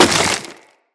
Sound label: Melee Impact Wood, Weapons